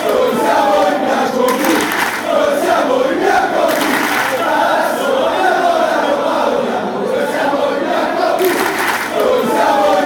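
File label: chant, football chants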